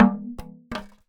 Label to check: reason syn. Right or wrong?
Wrong